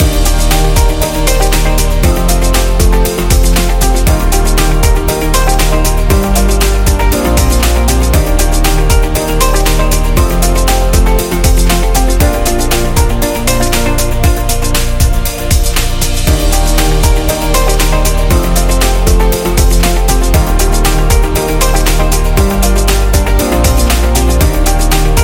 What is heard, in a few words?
Fashion Loop; corporation